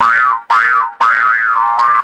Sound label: rapid cartoon spring boing sounds